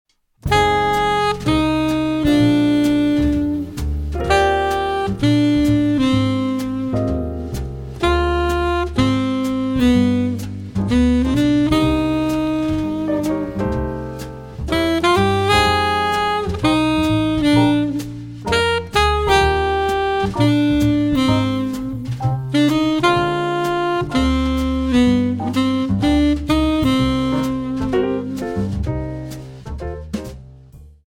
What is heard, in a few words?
sax phrasing alto